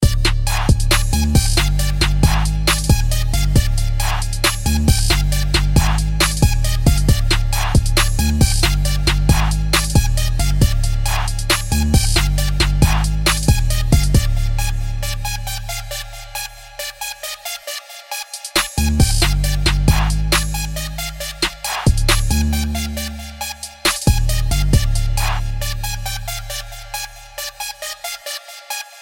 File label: trap ringtone